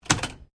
Typewriter Remington Standard 10 Single, Old typewriters; 1900S; Typing; Offices